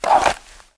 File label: Gun, Holster, Replace Gun; gun and weapon sounds; captain audio; fully loaded